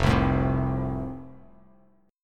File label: F Major, Bass Standard; strummed